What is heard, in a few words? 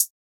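808 & 909 Kit CLOSED hi-hat; Roland Juno-D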